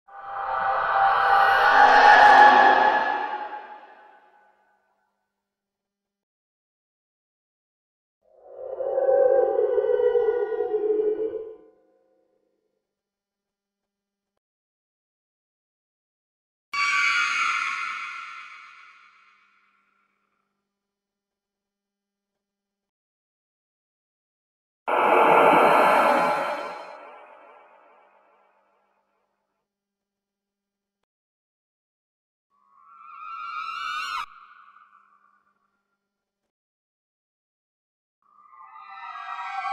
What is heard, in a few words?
creepy sounds horror sound suoni horror scary sounds scary sounds